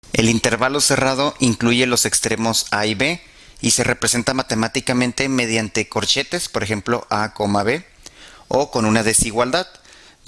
intervalo cerrado

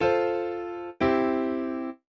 Cadencia Plagal

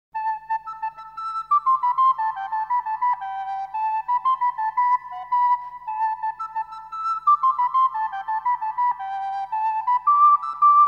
Monódica (flauta sola); Trotto. Anónimo S. XIV

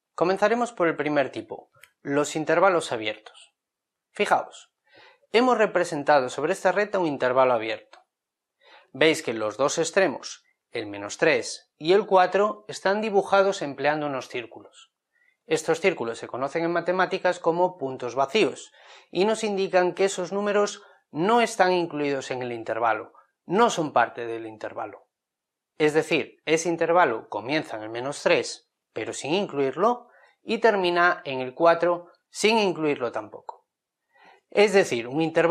intervalo abierto

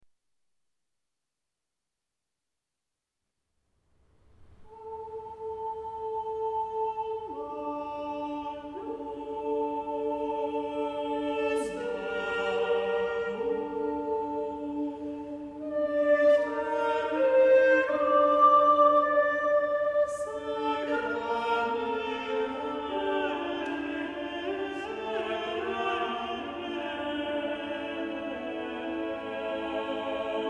polifonía

